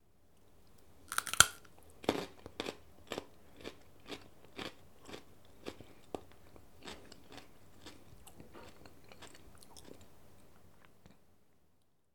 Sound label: Eating Carrot